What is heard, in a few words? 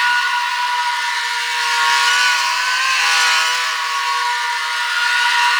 Boss Spirit Three Loop; Warframe; Cephalon Weave